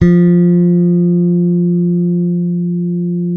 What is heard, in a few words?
bass, sustain; Sampleheads; Will Lee Bass Library